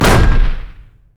basicattack; LoL; trundle

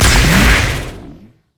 explode; LoL; velkoz skin01

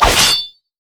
crit attack; masteryi base

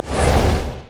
anchor chain; nautilus

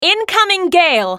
spell cast effort; JannaForecast

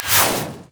carpet bomb; rumble

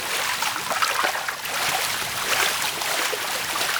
water small

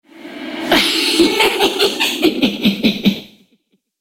spider laugh; Elise

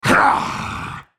spell cast effort; Renekton

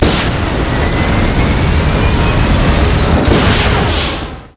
recall leadout; LoL; jinx base

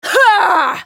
spell cast effort, en US; Sivir

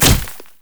spider basic attack; Elise

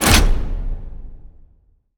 ui menu open; LoL; hud

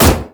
basic attack; rumble

